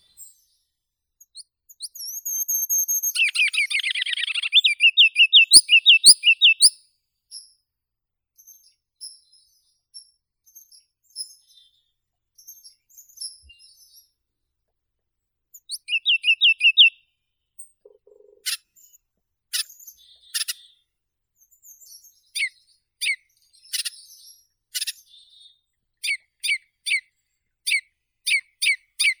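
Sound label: ruby-crowned kinglet, Regulus calendula; korolek rubinogolovii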